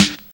00s clear snare drum g key, snare sample tuned to the G note